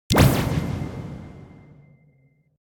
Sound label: powfire; slope-ball